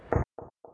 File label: dist gun